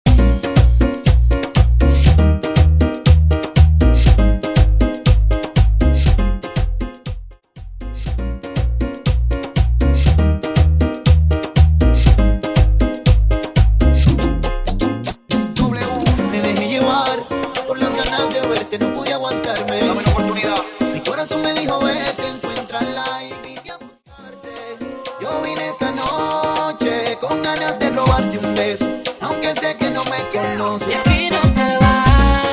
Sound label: Mambo 120 BPM; Mambo Project